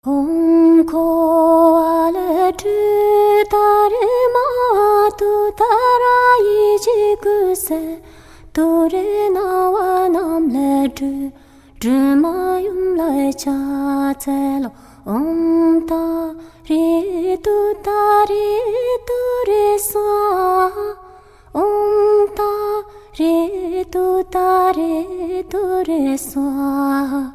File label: om tare tutare mantra